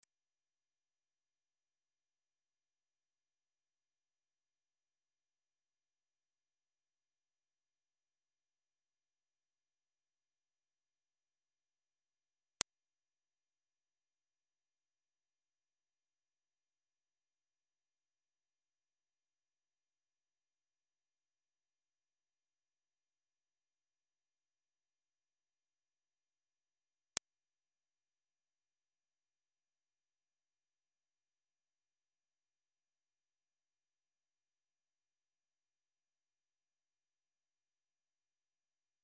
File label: 10kHz